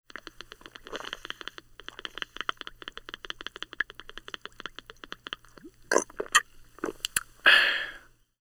drinking from a beer bottle

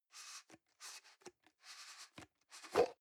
plastic can small lid screw open soft

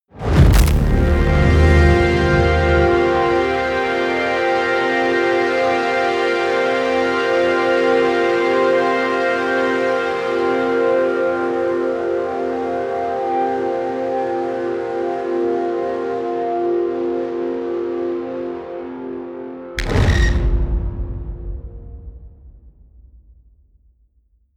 promo pad

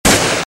short impact

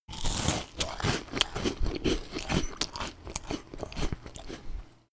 noisy crunch

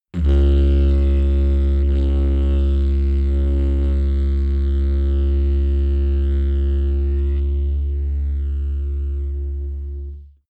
didgeridoo straight tone

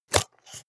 scissors cut paperboard

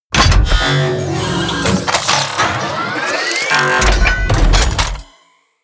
machine transformation

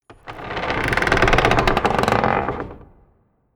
wood grind large

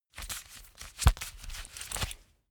leather belt open heavy

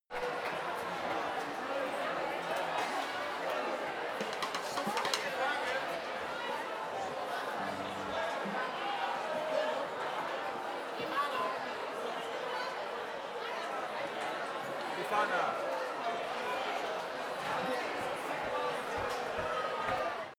mumble big audience marquee back

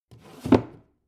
book shelve hard slide

